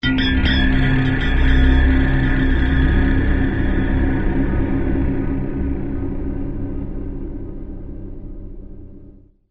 music sfx future alarm; AquaNox

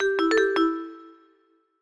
Jingles; Catnap

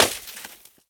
snowball land; Scrat

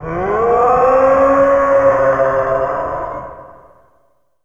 Dinosaur

